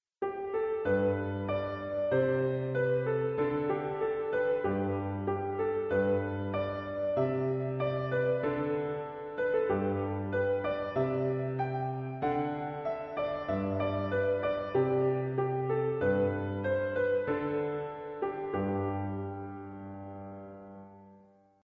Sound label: melody